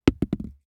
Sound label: DICE on Hard Wood, Throw and Roll, Foam, One Die; Samples; ArticulatedSounds; DICE Sfx Party (Extended)